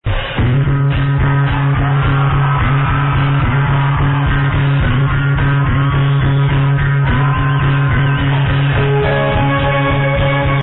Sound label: bass